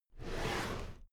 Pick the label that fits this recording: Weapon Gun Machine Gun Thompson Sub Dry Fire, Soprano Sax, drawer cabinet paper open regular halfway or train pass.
drawer cabinet paper open regular halfway